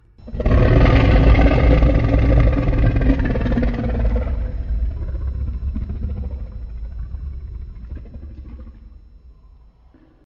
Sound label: Godzilla Low Growl